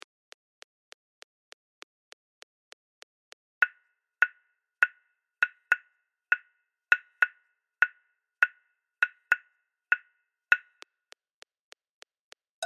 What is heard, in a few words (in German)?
Yanvalou 100bpm, lateinamerkanische rhythmen; Lateinamerika